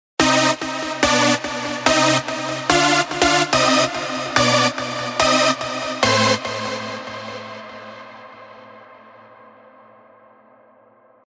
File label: rave stabs; Technosphere; revealed; Spire